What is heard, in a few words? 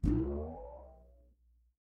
cartoon boing